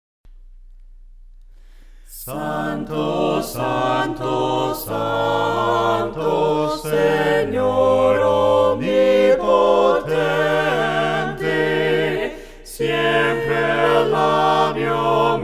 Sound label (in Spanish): SANTO SANTO SANTO VOCES, himnos a capella; cantos del camino